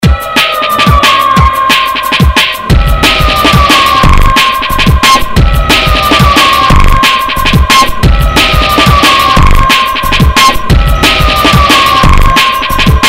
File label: like a drum rmx